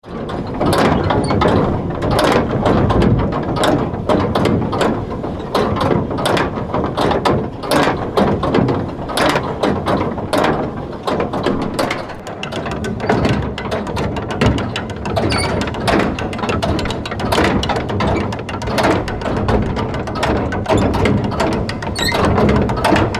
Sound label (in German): saegewerk gestaenge; waveland tonstudio